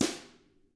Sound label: DW1BR FR R, Drums, BRUSHR